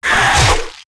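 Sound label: Skeleton Knight Attack; Seer; GamigoNA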